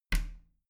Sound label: bathroom cabinet creak close soft; objekte; tueren